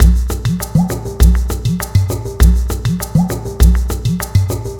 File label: Cave Ceremony, percussion